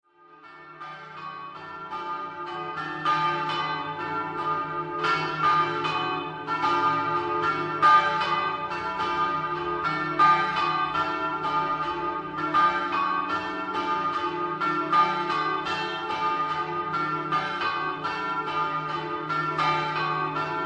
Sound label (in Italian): MAGNANO IN RIVIERA, campane